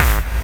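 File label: hard bass drums; Bazzdrumz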